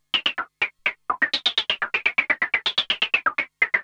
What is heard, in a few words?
Juno-60 Arp 125BPM